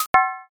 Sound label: use key